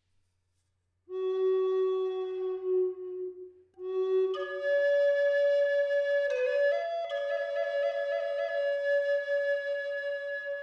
Haselnussast, G mittel 432Hz

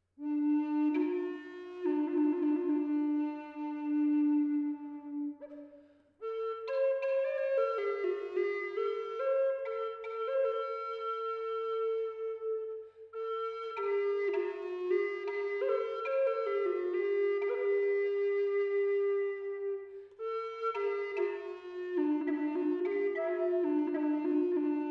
Weißtanne Dis tief 432Hz

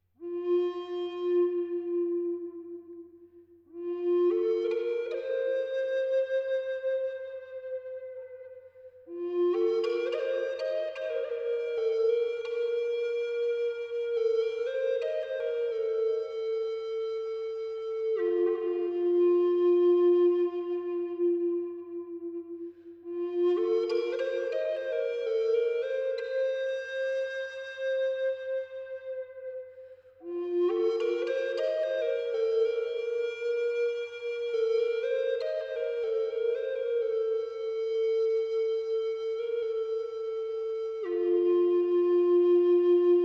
Haselnussast Fis tief 432Hz

